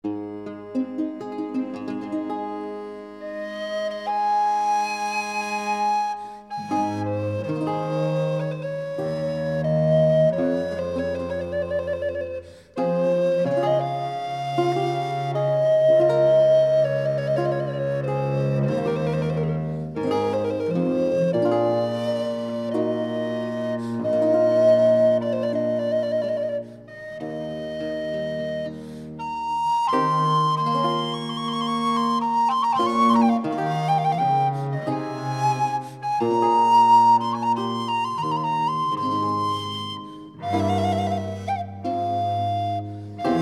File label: Preludes